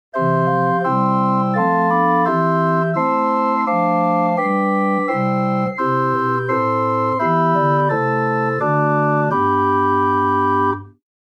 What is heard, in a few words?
Holz 8 Flach 2, organ; Buzard Opus 3